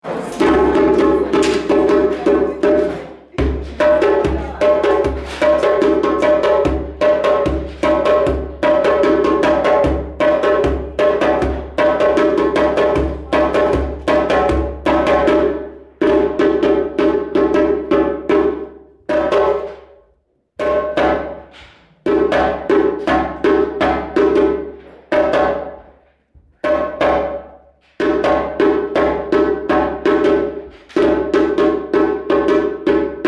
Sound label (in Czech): Bubny, djembe; lekce